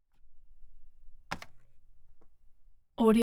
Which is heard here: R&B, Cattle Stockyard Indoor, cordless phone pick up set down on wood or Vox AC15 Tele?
cordless phone pick up set down on wood